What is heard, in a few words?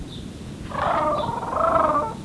pain; quake2; snork